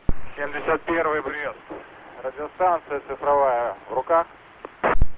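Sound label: cifra 71 minsk, digital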